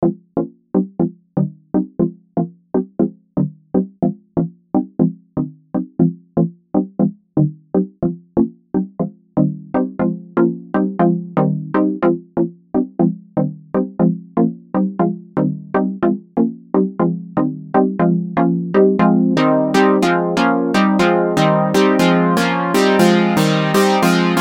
Prophet-5 FILTER

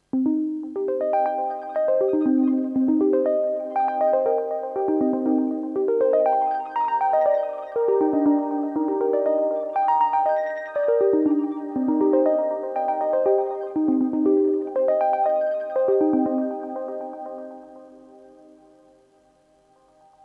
Mini V4 Steinhofkirche Vienna; VSL MIRPro3D; Arturia

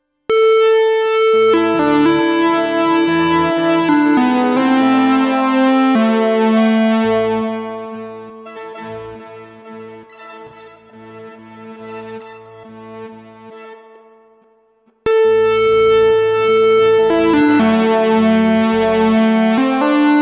Strymon Magneto Pitch under Sequencer Control

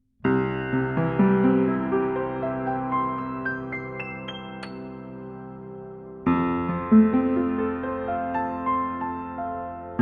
DPA 4060 Piano Mic Outside Wall with Boundary

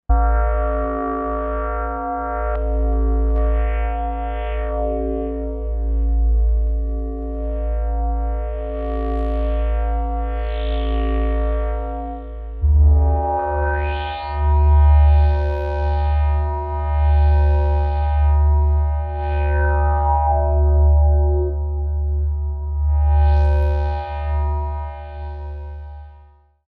Glass Bubbles; Fireball

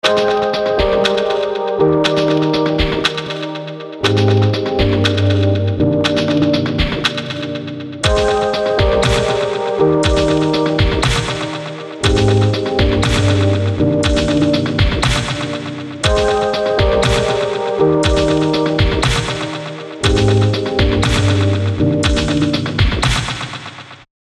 Electronic Grooves Medium Loud And Wide

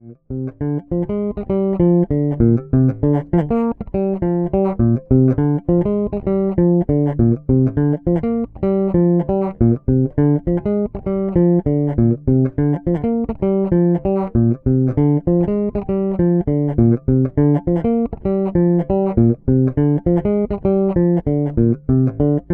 Sadowsky NYC Modern Bridge Pickup passiv

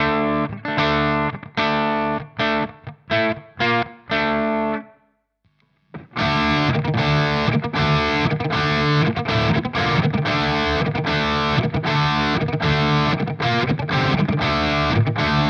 Vox Valvenergy Cutting Edge

